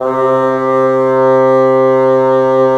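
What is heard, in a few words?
wind bassoon; LA Composer vol. 3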